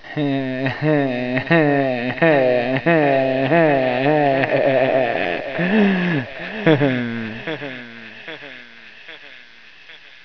laugh

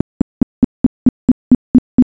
pulse

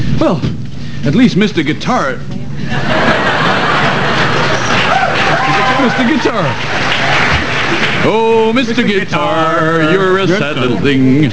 guitar

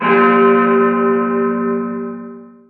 BELL

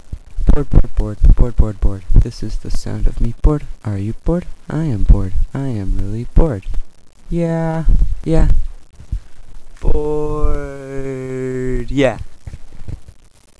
bored song

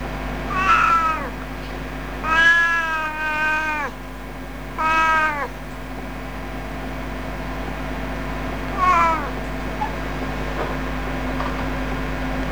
meow

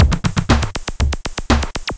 electro 120 fill

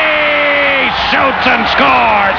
shoot

